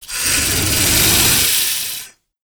Emperor Cobra Death

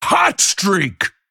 Female Dragon Wyrmkin Attack

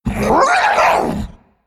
Female Beast Attack

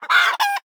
Angry Chickens Underlay Attack

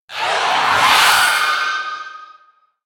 Violet spellwing Death

